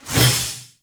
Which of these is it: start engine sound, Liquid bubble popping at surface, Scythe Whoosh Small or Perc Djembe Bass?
Scythe Whoosh Small